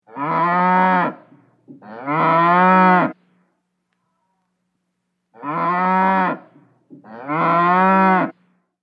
Cow Moos Four Times; Cows; Animals

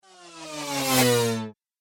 Space Bike Speedy, spacecraft sound effects

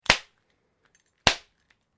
LEATHER STRAP CRACK; Material components for constructing more complex sounds; Dressing (Sound Effects:Human)

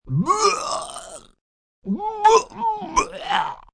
Vomit Opening, Vomitting, male, small dry heaves; human voice; Vomiting Sound Effects

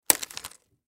Wire Cutter Cut Snip Cable Tie; Hand Tools; INDUSTRY; Blastwave

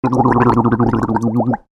human voice gargle male 30 years; Person Gargling Water - Male Approx 30 Years Old; McKinney Sound

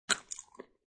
Biting a pistachio.; Eating (Sound Effects:Human)

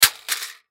Saw Drop Cement; Saws; Machines; INDUSTRY

